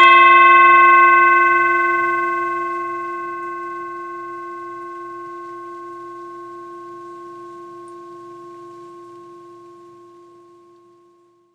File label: bowl